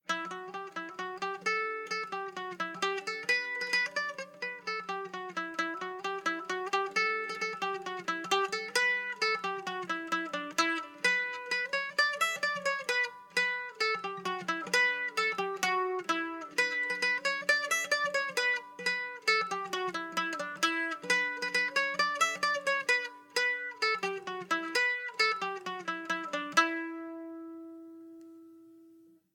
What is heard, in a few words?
Tavern; assassin s creed unity gamerip 2014